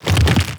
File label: regular, zombie blind, foley; creatures